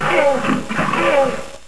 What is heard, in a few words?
raptor kaw; avp2